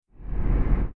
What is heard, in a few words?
impact shot effect